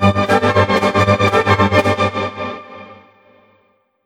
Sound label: ORCHFX, orchestra, Asian Traditions